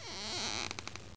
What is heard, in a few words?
CREAK